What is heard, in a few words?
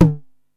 TOM M, 909; musicradar-synth-samples; Classic drum machines; 909TOMS